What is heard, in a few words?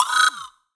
positive, Digital Sci Fi UI; The Mooseman